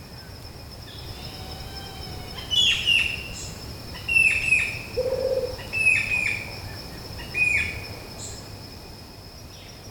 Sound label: Trepador Garganta Blanca, Xiphocolaptes albicollis, White-throated Woodcreeper; cantos